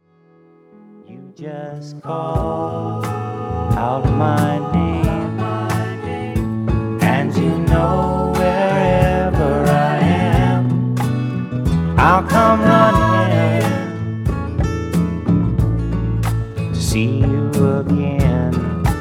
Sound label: male falk laser